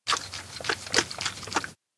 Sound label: shaker shake small; anemoi